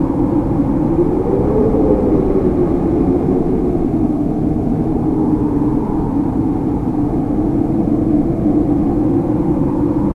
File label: wind, ambient; STALZONE